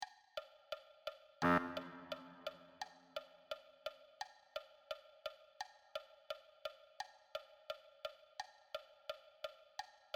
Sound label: Baritone Sax